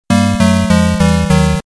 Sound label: lose round; Scratch; Duck Hunt